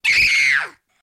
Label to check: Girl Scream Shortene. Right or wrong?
Right